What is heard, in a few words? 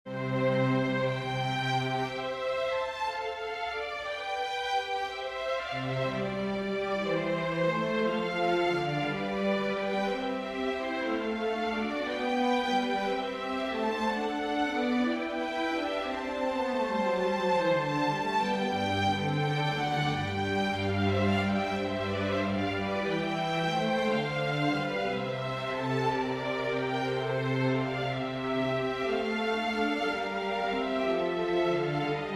for winds strings; purcell henry; expected spring last come